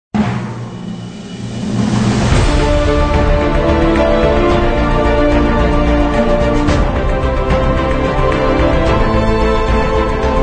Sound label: Blockbuster Trailer Finale